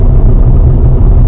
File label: boot idling; Stunt GP; mgf boot